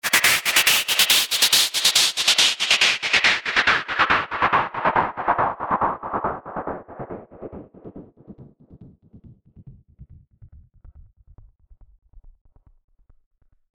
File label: Pink Noise Effix Delayed; Techno